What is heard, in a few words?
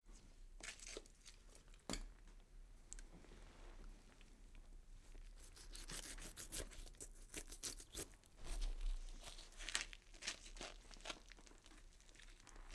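cutting and processing meat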